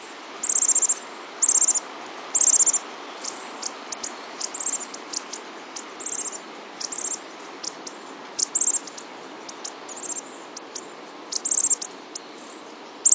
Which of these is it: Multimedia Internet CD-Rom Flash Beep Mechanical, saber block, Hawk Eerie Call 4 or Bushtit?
Bushtit